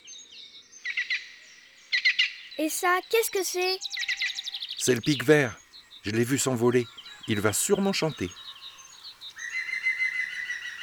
le pic vert; cuicui et compagnie

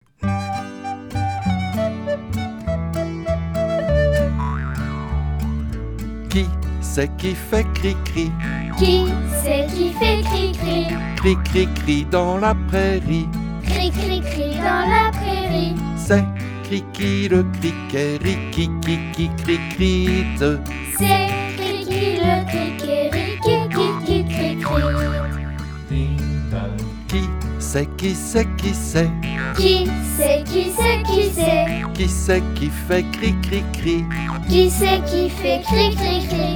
Criki Chanson

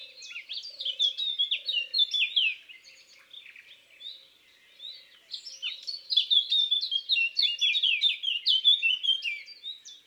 Fauvette a tete noire Chants d'oiseaux des bois et des forets

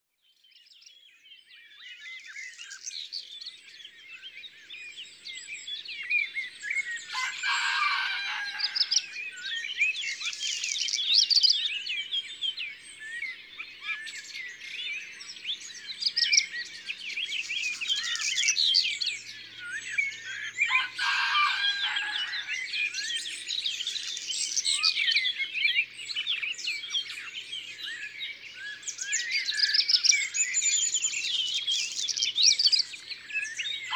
Rougequeue noir, Concerts Naturels de la Drome; Studio les Trois Becs

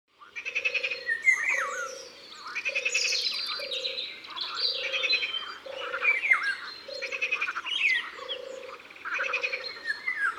Loriot d'Europe, Concerts Naturels de la Drôme; Studio les Trois Becs

